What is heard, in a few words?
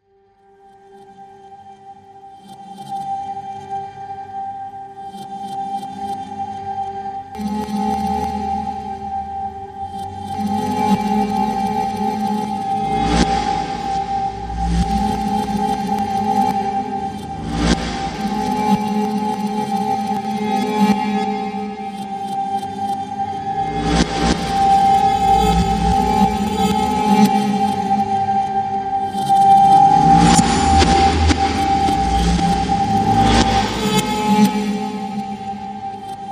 Ambient Dub